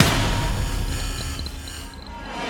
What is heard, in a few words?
Golem